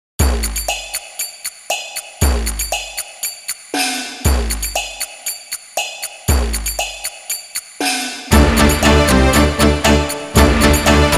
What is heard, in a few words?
Mononoke Melody; Taishou Mononoke Ibunroku